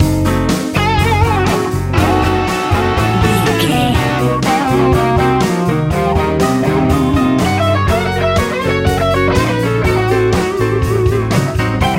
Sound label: easy rock